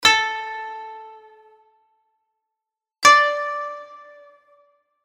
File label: koto